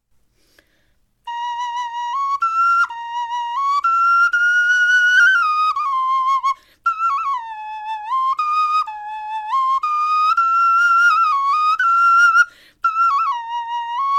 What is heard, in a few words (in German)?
melodie, knochenfloeten